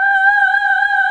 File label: soprano C5; Zero G; Man Machine